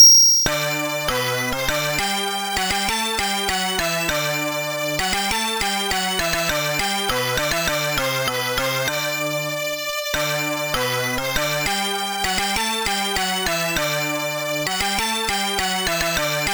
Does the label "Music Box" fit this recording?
Yes